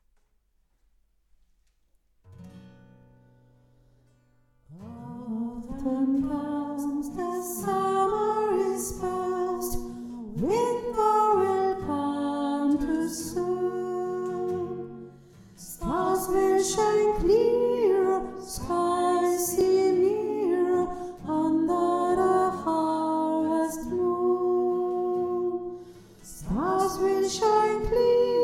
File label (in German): autum comes hohe stimme